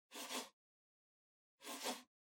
PC Mouse Move Far Wood Short

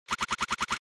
SNES Action Message

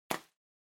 Packaging Air Cushion Pop; 梱包用エアークッション01-09(弾ける); 効果音＞梱包材

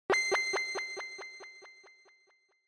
Inspiration, High Delay

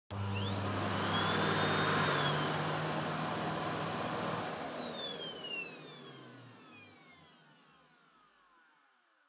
Vacuum Cleaner Vacuum Floor Soft Low Still; 掃除機; バキューム 床 ソフト 低 静止

